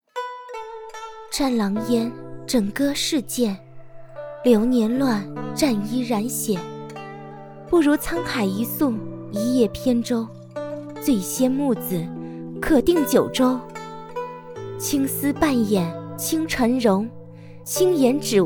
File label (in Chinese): 古风女声